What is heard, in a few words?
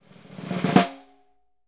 SNR, Synclavier Percussion 2 - World & Orchestral